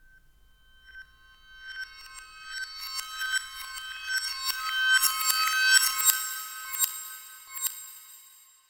tinnitus

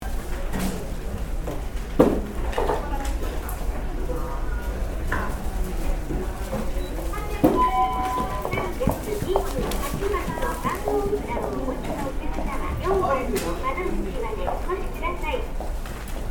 post office

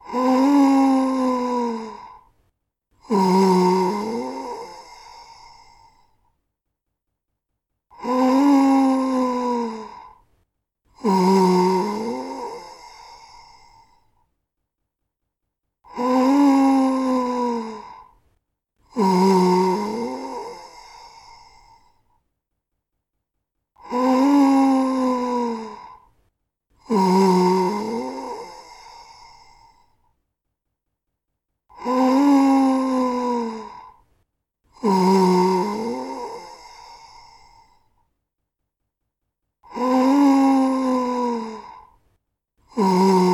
old man breath; horror

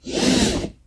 seatbelt; car

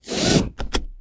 seatbelt on; car

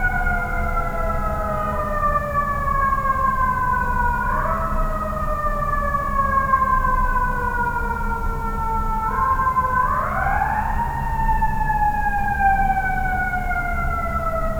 night siren

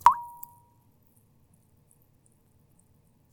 drops on bowl, 茶碗に水滴; 茶碗に水滴３; wetarea

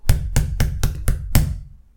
hit concrete wall; knocking

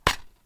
tallcase open

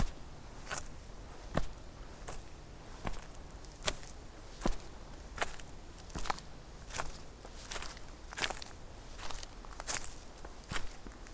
mountain road

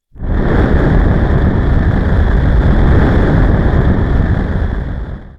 dragon breath; monster

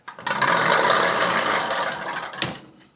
Japanese slide door O

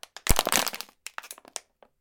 crash PET

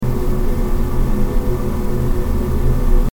air conditioner

